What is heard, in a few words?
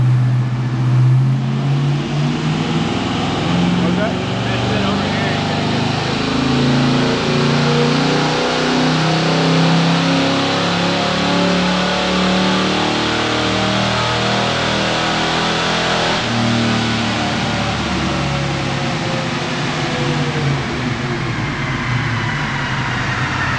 charger dyno